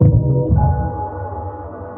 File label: sonar Ping Air Medium Shuttle Directional; Barotrauma; Real Sonar